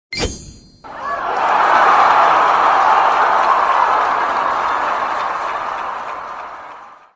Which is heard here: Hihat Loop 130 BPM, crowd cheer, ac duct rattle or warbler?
crowd cheer